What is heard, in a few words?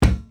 imp metal crouch front; Foley; Doom